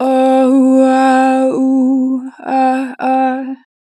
Adlib - 2 bar vib, Natural Vibrato, Vocals; Adlib 04; Harmor Vocals

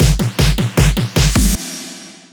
fill loop 155; GhostHack

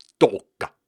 Syllables and Imaginary Words, Viking Vocal, Hard; Viking Vocal Builder

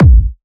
Techno, Kick; Techno Sounds 2024

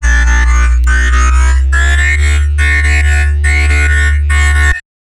Bassline, Dubstep and Trap

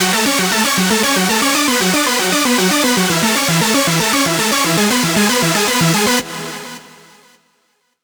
lead loop F#min 155; Music Loops; GhostHack

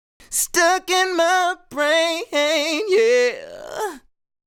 Sung Phrase Dry, 100 BPM D Maj, vocal; Stuck; Cymatics; Euphoria Vocal Sample Pack

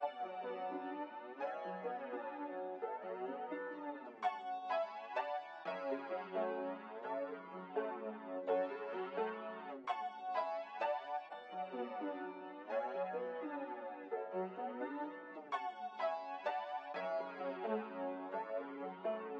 85 BPM F Min Piano Delay; Loop Stems; Cymatics; Rushing

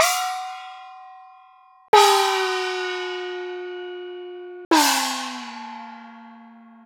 gong high, Cartoon Film Effects

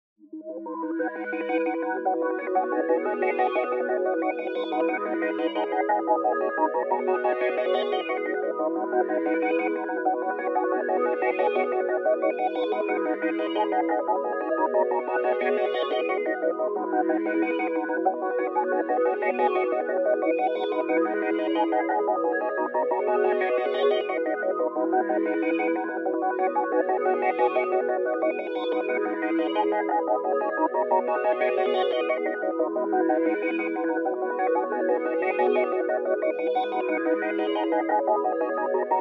120 BPM C# Minor Synth Arp; Cymatics; Neptune

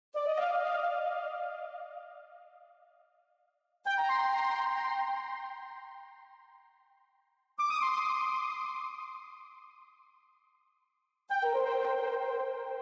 129 BPM C Min Texture Arp, melody loop stem; Trap; Loop Stems; Cymatics; 2022 Melody Collection - Trap Pt 2; Richer Than Ever

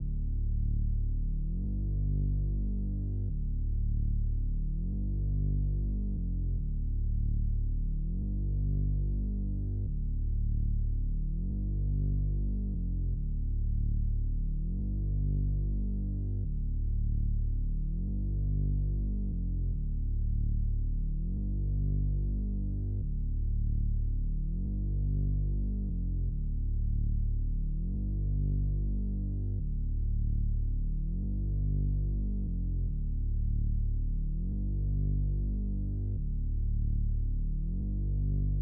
146 BPM G Min Bass; Melody; Loop Stems; Drill; Cymatics; 2022 Melody Collection - Drill; Meet The Reaper

